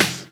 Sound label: Claps; Vengeance Samples